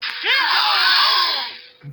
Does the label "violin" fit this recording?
No